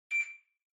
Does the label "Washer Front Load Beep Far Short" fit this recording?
Yes